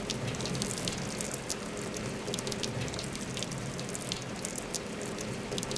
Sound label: rain glass; The Sum of All Fears